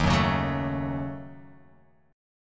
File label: Eb Minor 7th Flat 5th Eb down Guitar Open F; strummed; chord; JGuitar